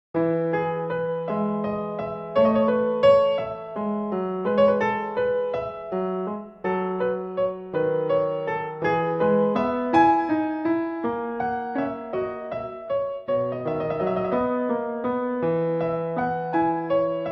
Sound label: Prelude in E Major; Bach; BWV854A